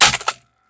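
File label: rifle drop glass; STALKER; IWP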